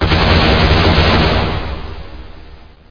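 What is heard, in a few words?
weapon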